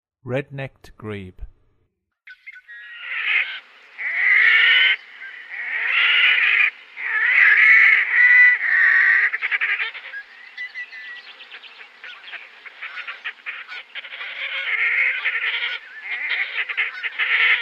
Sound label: Red-Necked Grebe, Western USA Songbirds; North America